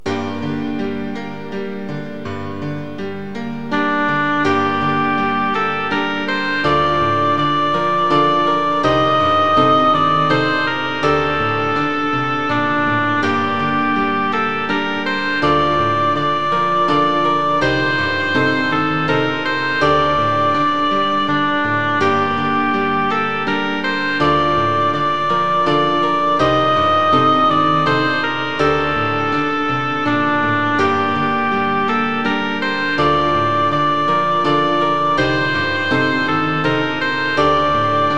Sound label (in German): oboeneinspielung